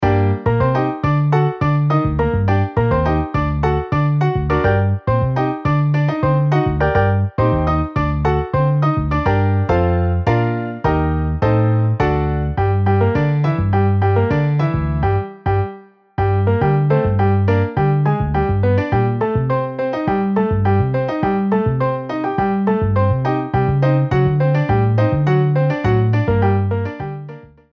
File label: Piano Bass MIDI